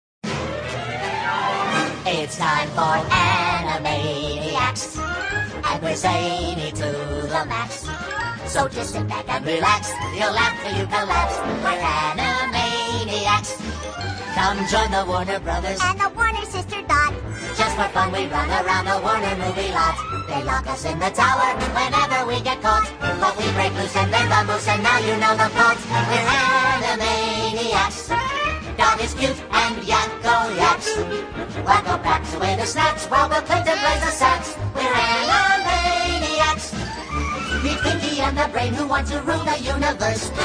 Cancion dibujo animados; graciosos

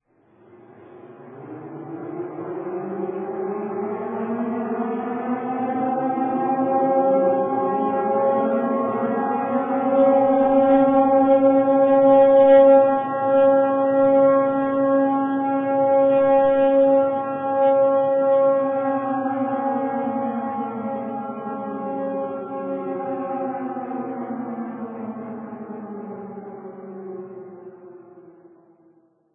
Sirena antiaerea; alarmas

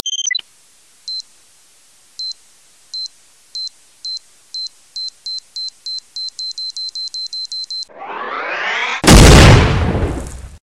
Bomba de tiempo, tonos de risa

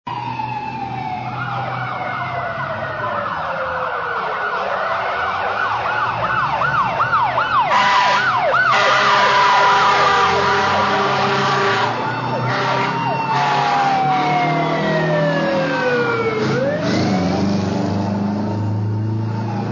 Sirena bomberos; alarmas

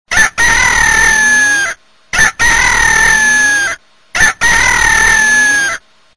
Gallo

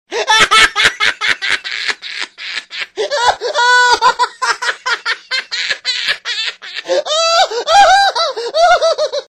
Riendo a carcajadas; graciosos

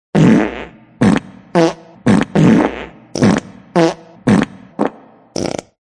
Gases; graciosos

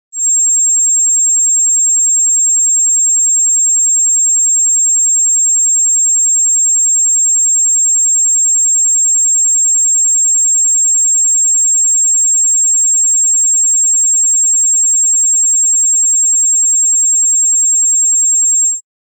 Zumbido molesto; graciosos

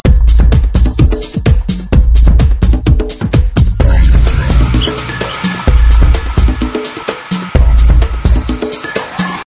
Bateria ritmica; tonos de llamada

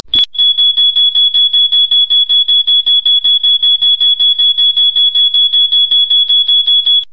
Alarma de humo; alarmas

